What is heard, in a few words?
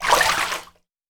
Shallow Water